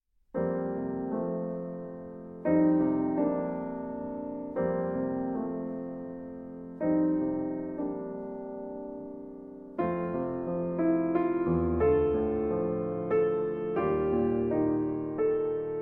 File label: Arr. For Piano